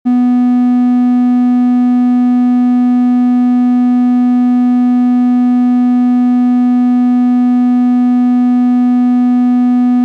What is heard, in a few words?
B3 246 90; Brainicity